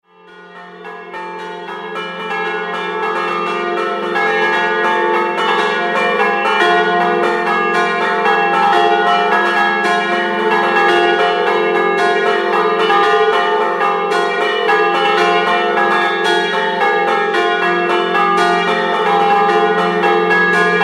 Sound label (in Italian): campane; FRAELACCO